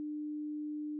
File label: 300 Right, 300Hz no left channel; 300Hz 1800 IPD; IPDs (for low frequencies) and IIDs (for high frequencies); Fundamentals of Sound